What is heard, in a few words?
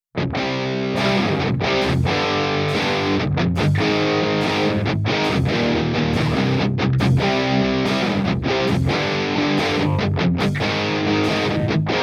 Metal Maschine + Big Rock Drums